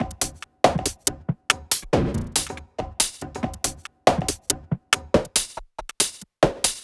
Loops 140 BPM Hihat Percussion Loops

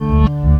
REV HOOK, Synth FX Misc

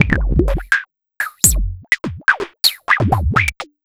Drums Strange

